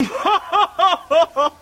cheer

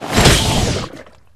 Bio Rifle Vent; Requests Studio; UT3

